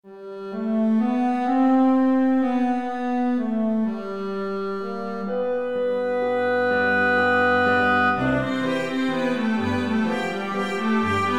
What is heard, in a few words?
waltz